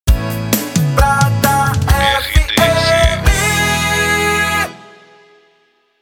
ponte sertanejo, vinhetas cantadas; Pacote Rádio Com

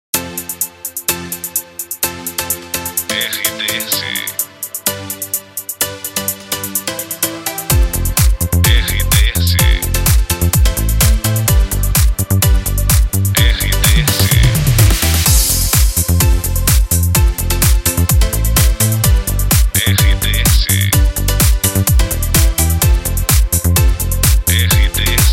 trilha para locução; Pacote Maximus FM de Rio Paranaíba/MG. Emissora com perfil Jovem

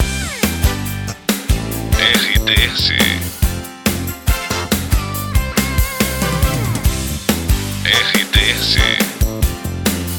TRILHA PARA LOCUCAO; Expresso

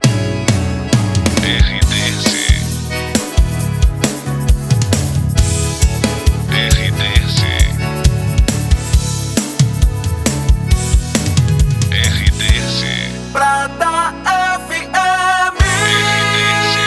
SB RADIO COM SERTANEJO

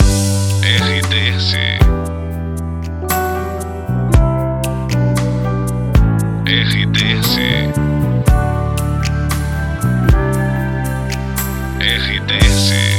trilha para locução; Santos FM

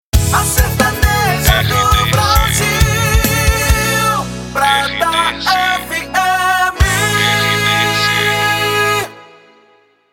PASSAGEM RADIO COM SERTANEJO

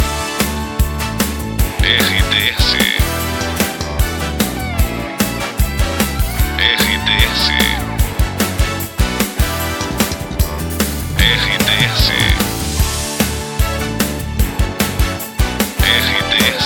TRILHA LOCUCAO; Expresso

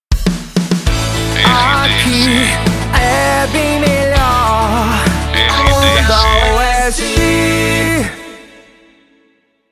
passagem; Pacote Onda Oeste FM, Piumhi/MG. uma estação de rádio pop rock

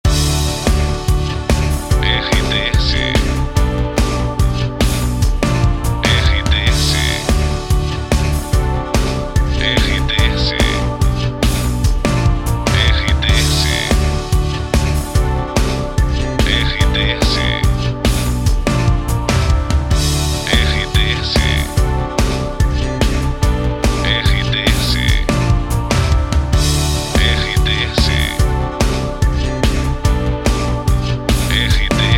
trilha para locução; Prata FM 2021

